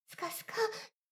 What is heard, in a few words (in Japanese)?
シコシコ音声, ASMR; ダメなメイド